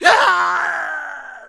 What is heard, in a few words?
death